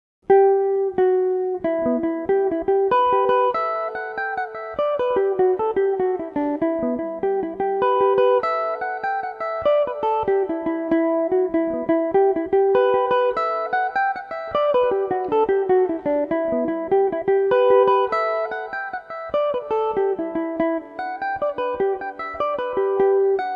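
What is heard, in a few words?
jig E dorian, irish mandolin; jig of the dead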